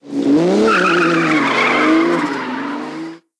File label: Vehicle Engine; blue archive